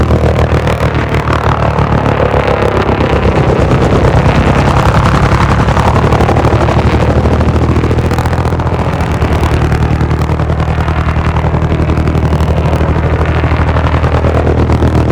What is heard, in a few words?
Bomber Engine Loop Close; Warframe; Eidolon; Grn Bomber